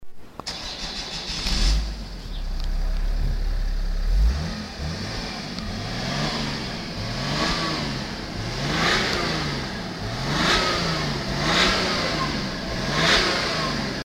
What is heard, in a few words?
BMW 1er 335d